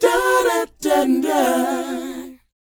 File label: DOWOP G, Vocal R&B DOO WOP; ILIO; Vocal Planet